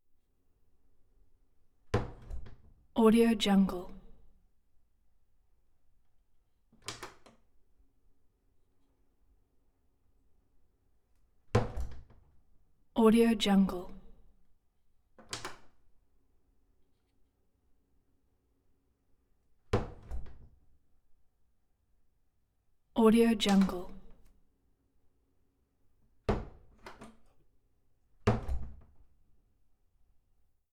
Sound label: door latch bathroom slip loose from latch